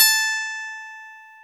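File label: guitar A4; AKAI S-Series CD-ROM Sound Library VOL-8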